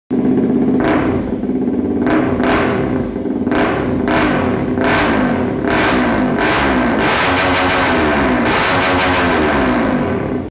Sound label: RC exhaust